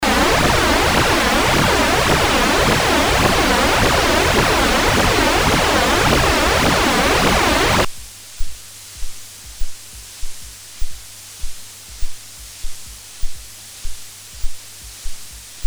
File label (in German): Roland JU06 vs Roland Juno106 Chorus Rauschen